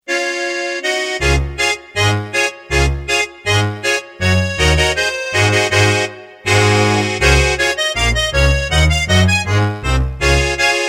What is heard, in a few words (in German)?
Haemmerchen Polka